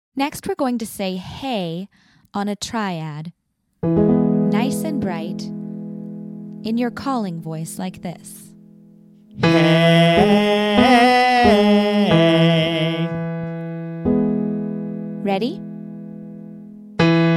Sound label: Belt Hey Triad